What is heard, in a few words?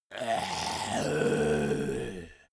monster growl unmotivated; kreaturen